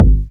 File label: Kick Slam